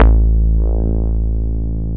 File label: DIGI BASS; DIGI BS